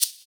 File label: Shaker; Equipped Music; Loungin House